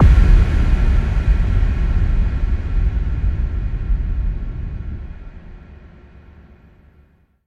Hits & Explode

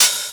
OP Hihat; Cymbals; Open Hihats; VES1 Open Hihats

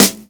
Snare; Vengeance Samples

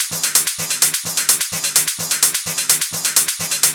House Loop, 128 BPM; Vengeance Samples

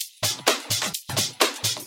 House loops 128BPM; Vengeance Samples

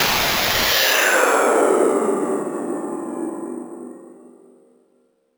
FX Downlifter

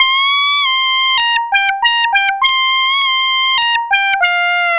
ARP Odyssey, Arp A Line, synth samples; musicradar-synth-samples

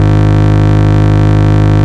Bass, Root G#; Vengeance Samples

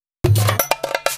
Fill 128 BPM; Loops; Vengeance Samples

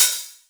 Cymbals Open HH; Vengeance Samples

